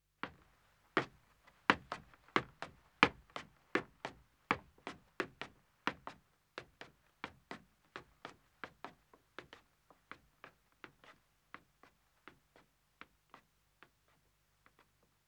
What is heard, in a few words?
Wooden Stairs Ascending, 2 Men, Footsteps; BBC Sound Effects Library; Footsteps II